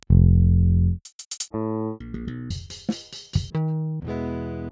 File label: Pultec; Virtual Analog